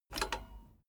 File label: bmx bike pedal foot release soft